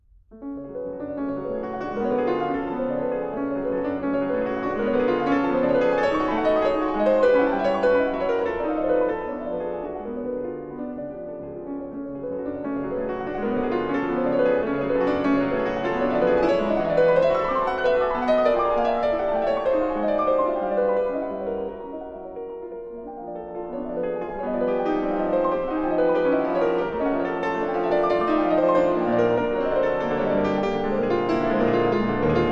F-sharp minor: Molto agitato, piano; 24 Préludes, op. 28 no. 8

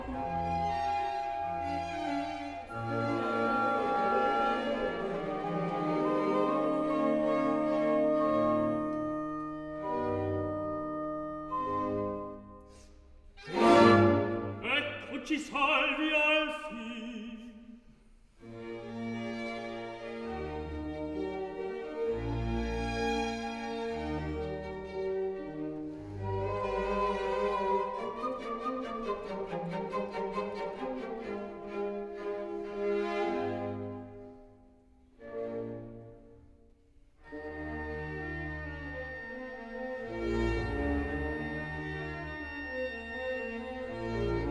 opera, Pantomima, Recitativo

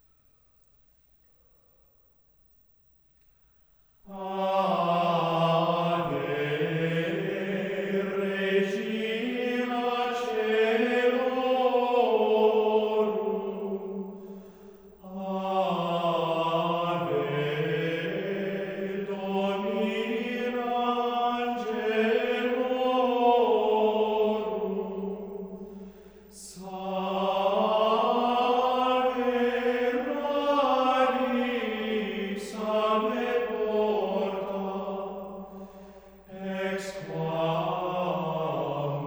Ave regina antiphon in mode 6, Chants; Ave regina (solemn mode); The Chants of Mary

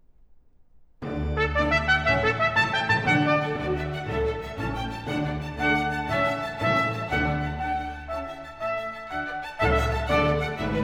Sinfonia for trumpets, violins & continuo Allegro

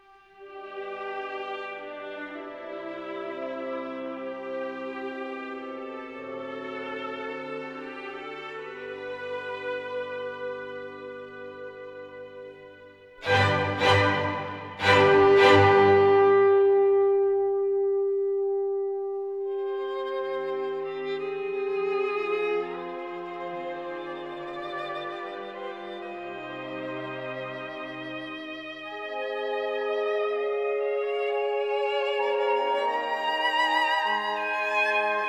Scottish Fantasy, for violin & orchestra, Adagio; 3rd Movement.

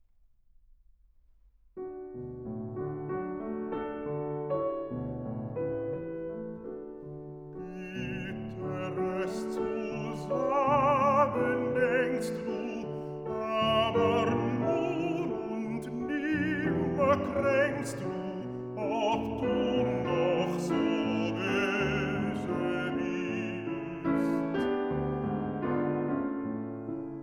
Songs for voice & piano; Bitteres zu sagen denkst du; Brahms, Liszt Lieder

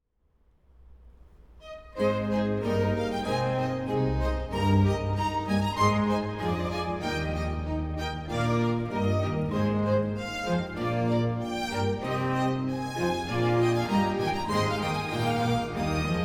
Introduzione (Allegro); Gloria; Vivaldi, Corelli, Scarlatti; O di Betlemme altera

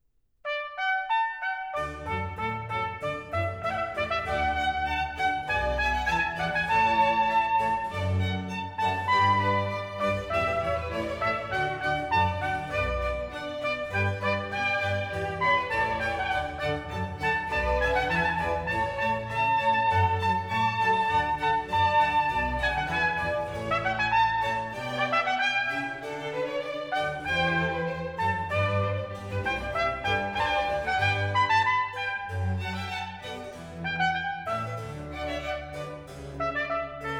Sinfonia in D Major for Trumpet, Strings and B．C．; Spiritosa; Il Barcheggio; Baroque duet

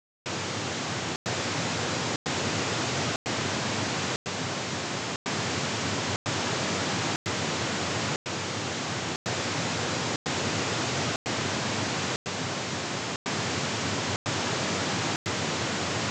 Stepped narrowband stereo pan; Stereo Setup and Imaging; Surround Spectacular

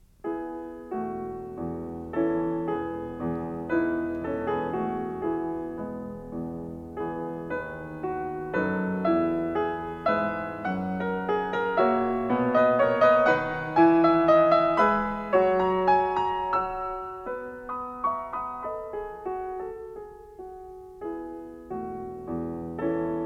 Waltzes for piano, 4 hands (or piano), in E Major; Brahms

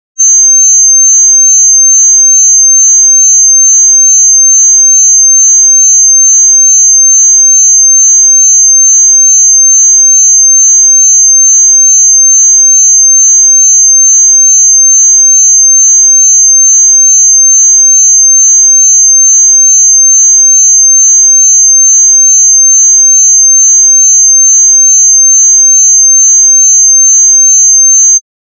6301hz Sine Wave 0db L+R; Denon Audio Technical CD

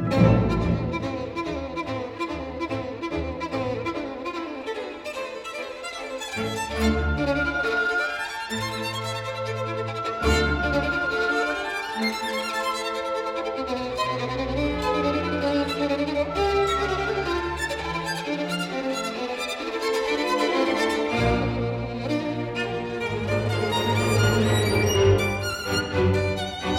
Violin Concerto in A minor: Allegro con fuoco; Violin Concerto No. 3

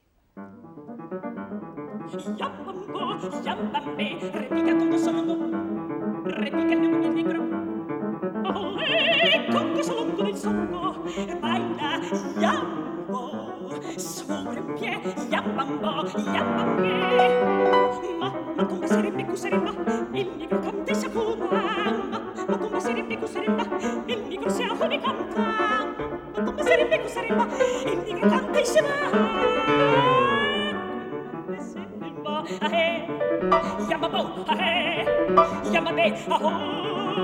Canciones negras for voice & piano (or orchestra)

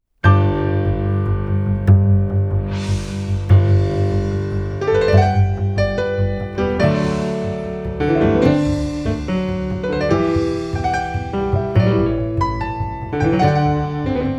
Variations, for keyboard

